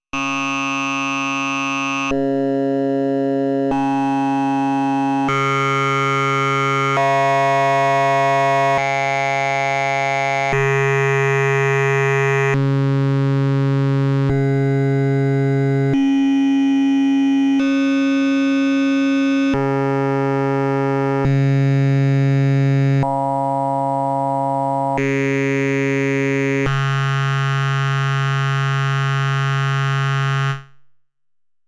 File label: Studio Electronics Quadnic Waveforms Bank